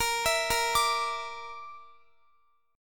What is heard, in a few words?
A sharp Major Flat 5th, Guitar; A#Mb5; arpeggiated; x,x,22,21,22,22